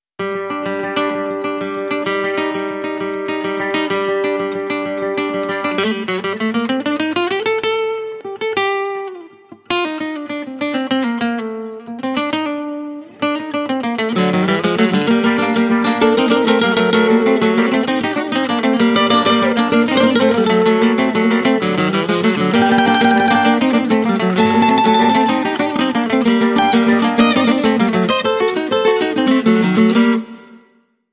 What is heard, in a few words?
Guitar Only